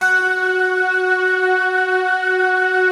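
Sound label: harpsichord, slow harpsiflute; Optical Media International; Sonic Images Library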